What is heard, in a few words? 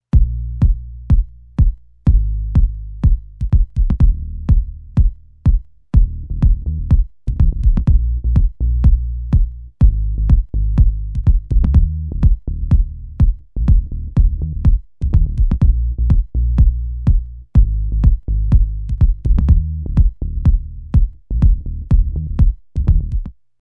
Bass Kick Processed